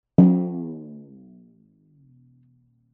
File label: Glissando Down